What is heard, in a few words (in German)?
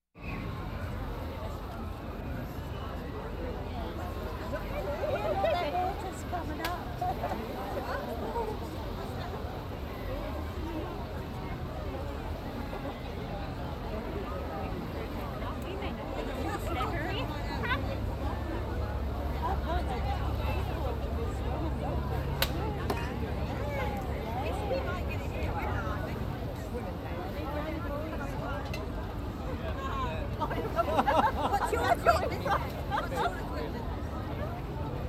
menschenmenge bei einem umzug; Großstadtgeraeusche